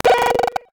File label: Short Circuit; Android; Google; Android Open Source Project